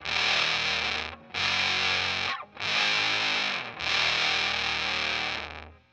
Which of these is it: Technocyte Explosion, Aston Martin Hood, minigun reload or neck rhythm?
neck rhythm